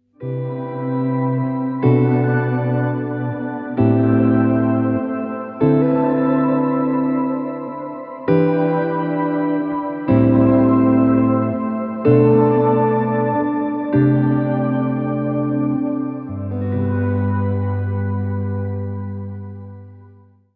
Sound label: shimmer; ventris